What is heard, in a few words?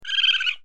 mýval raccoon